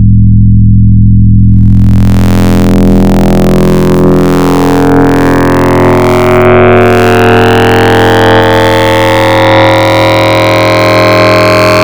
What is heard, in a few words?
VCos Tri Mod, Wavetables; Synthetic's Serum Collection; Cymatics - Nuclear for Xfer Serum Wavetables